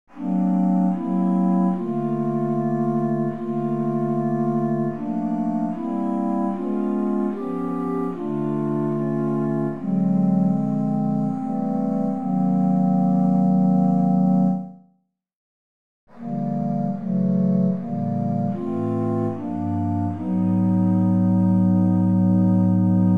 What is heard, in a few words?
All Parts, barbershop tags